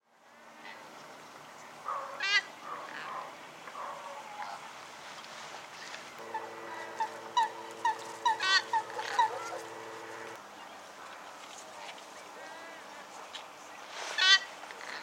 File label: Western swamphen